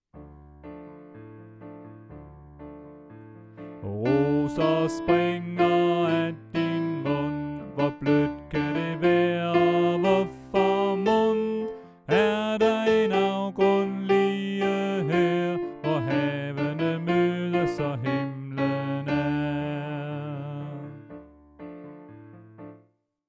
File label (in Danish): Sopran; oevefiler